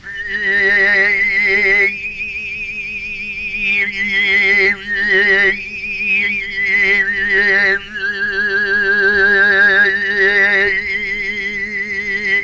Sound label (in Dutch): tuvazang